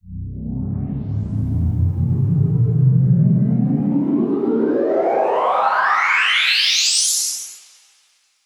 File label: Uplifter